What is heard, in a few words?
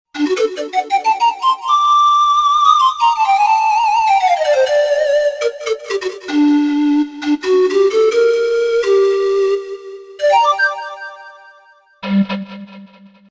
panflute